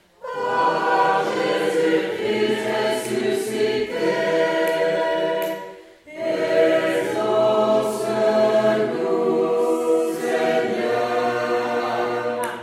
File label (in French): Tutti; Par Jesus Christ ressuscite